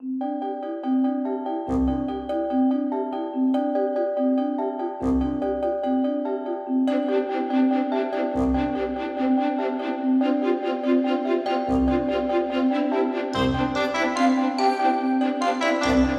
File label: GTR